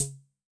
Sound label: Conga Lo; drum